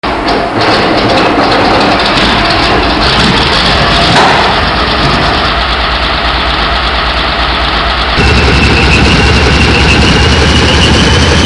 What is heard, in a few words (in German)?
Panzer Tiger, modellbau; Soundbeispiele